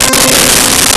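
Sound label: Synth Brass C5; Nintendo; July 2020 Gigaleak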